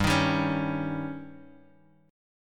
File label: Eb 7th